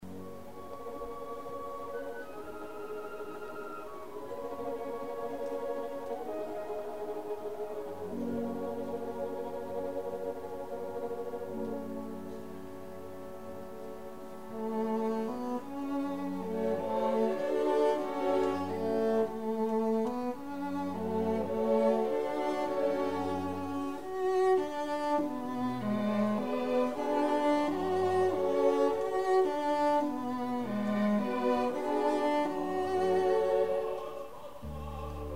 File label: cello solo